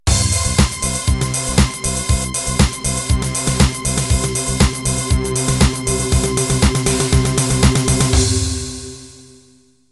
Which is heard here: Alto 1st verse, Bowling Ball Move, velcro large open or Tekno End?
Tekno End